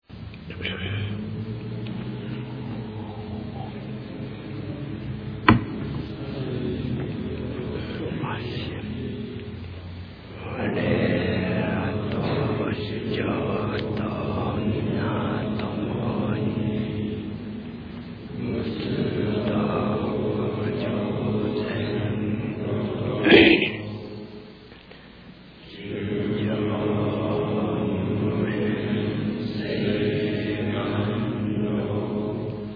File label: end chant